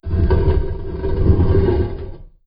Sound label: stone